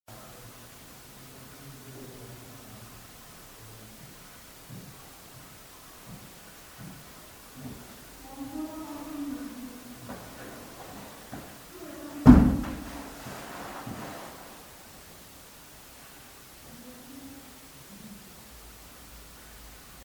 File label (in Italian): Passi e colpo in ambiente vuoto, noi eravamo nel chiostro; infatti si sentono le nostre voci; AbbaziaVangadizza